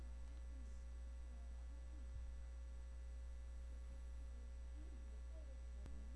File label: rinse